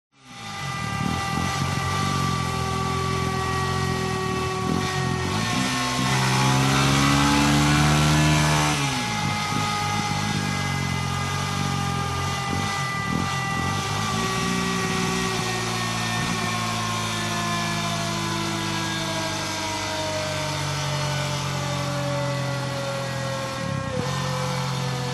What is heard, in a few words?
TRANSPORTATION MOTORCYCLE Engine Howl; smartsound